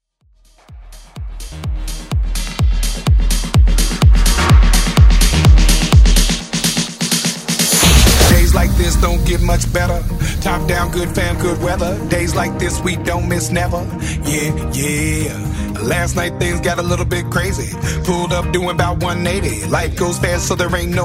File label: Worship Festival MashUp